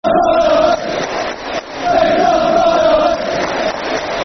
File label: Barmy Army, Football Chant (Soccer Song); Big Sam's Barmy Army; Newcastle United Nufc